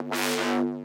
Bazz; Sequel 3; Dubtekk

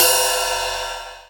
Ride; Ride LD; Ableton; Factory Packs; Drum Hits

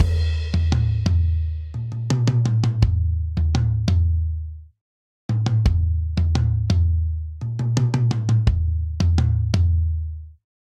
Toms Pop Breakdown 85 bpm; 4 4; MIDI Clips; Ableton; Factory Packs; Drum Booth

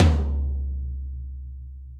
Tom Low Room With A Vista, Drum Hits; Standard Hits; Ableton; Factory Packs; Session Drums Studio

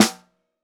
SN1 Bottom, Snare

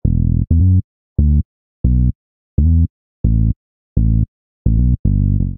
Bass E Minor 86 bpm, Bassline; MIDI Clips; Tonal; Beat That; Ableton; Factory Packs; Chop and Swing

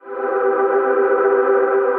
Iceflow Shush, Synth Pad; Ableton; Beat Tools

